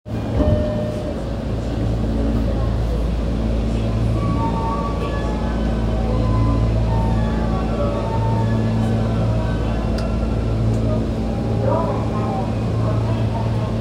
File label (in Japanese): 丸ノ内線新宿御苑前西